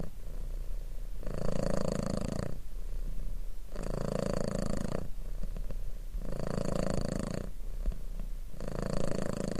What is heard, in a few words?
cat purring